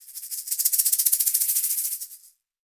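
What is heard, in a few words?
shaker egg, percussion; ILIO; Synclavier Percussion 2; World & Orchestral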